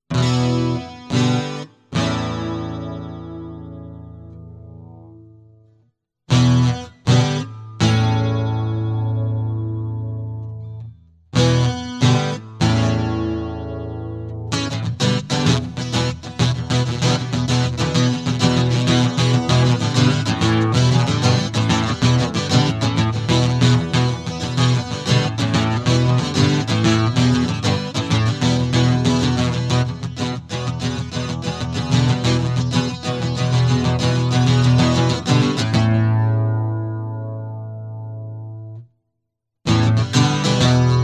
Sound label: Reverse Soundcheck